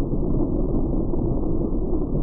engine; sole defender

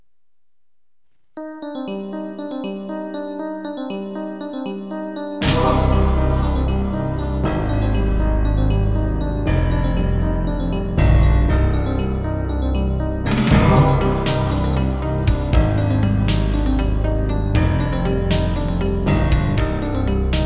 music

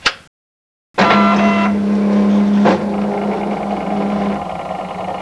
COP ON

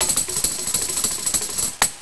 TAMBORIN

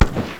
RIFLE

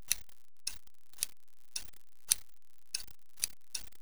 SCISSOR

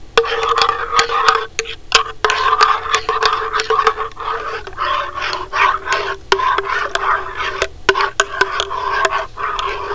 STIRRING

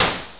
HH NOISE

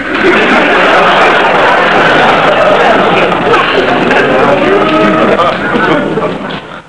LAFF TRAK

